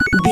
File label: retro beeps success